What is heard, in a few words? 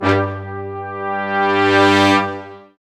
BRS, Lrg Ensemble; Roland L-CD702